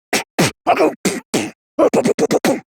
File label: beatbox